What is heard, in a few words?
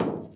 bumper; PC Gamer IT CD 45